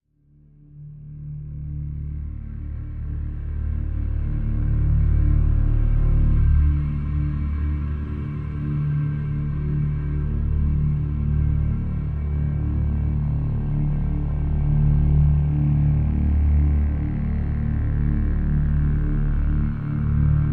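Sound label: Drone Reactor D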